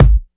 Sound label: natural kick drum g sharp key